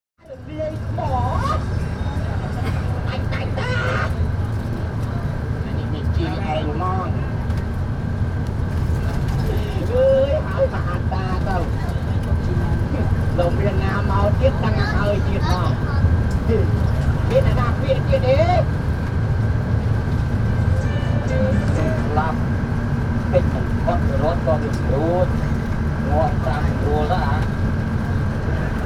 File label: Soap opera on TV in the bus, CAMBODIA; Les Cartes Postales Sonores; NOISE ON EARTH Vol1